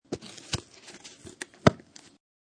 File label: Plastic Cup Open Lid; Sound Effects:Materials